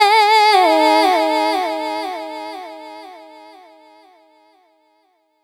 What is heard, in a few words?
120 VOCAL LOOP